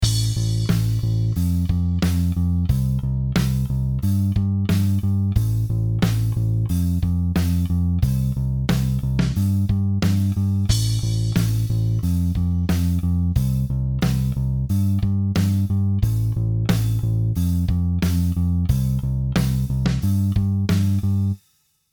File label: Bass